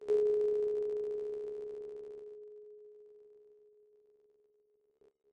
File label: E7 sharp 9 g sharp